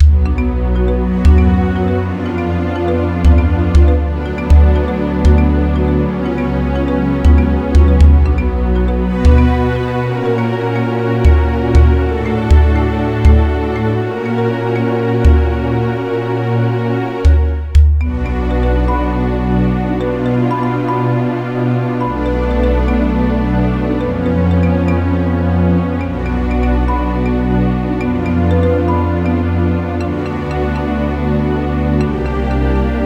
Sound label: music beach bpm120; Donkey Kong Country Returns HD